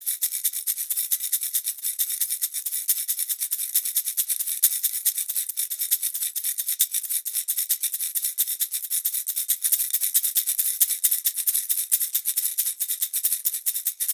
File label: Silver shaker; Warrior (Tohoqashaw)